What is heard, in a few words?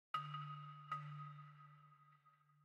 Balafon; Frozen Flame; windows